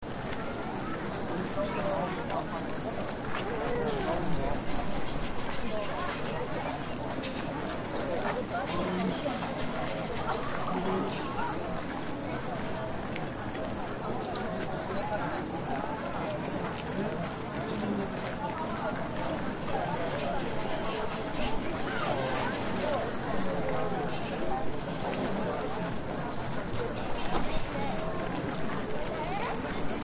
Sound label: shrine